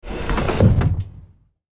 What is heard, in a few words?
Wooden drawer full open; household